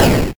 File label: blast